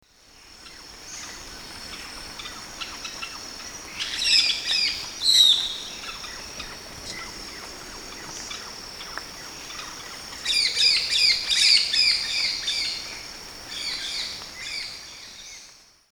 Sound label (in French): caique maipouri; montagne des chevaux